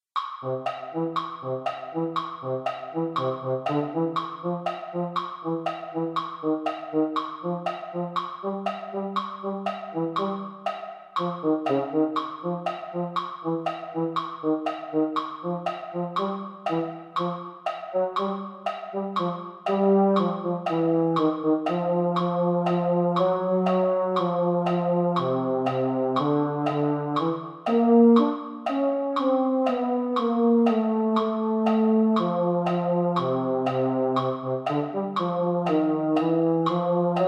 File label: baritone; holiday brass